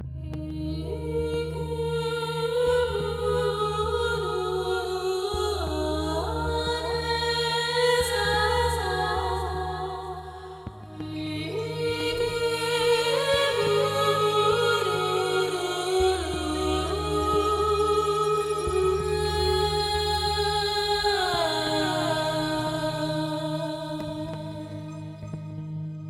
oddyssey chant; Rise and Shine

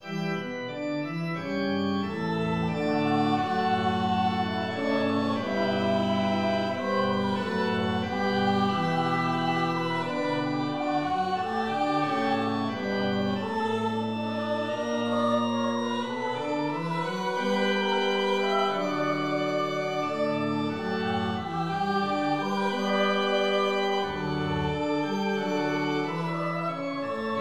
Chant Organ; Swan; Odette

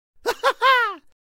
Fight end; Hank

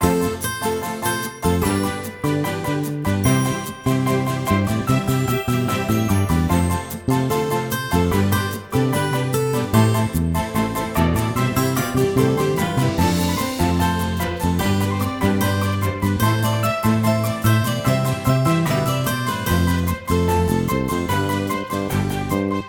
Happy

